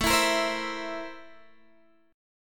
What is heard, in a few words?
C 13th A; strummed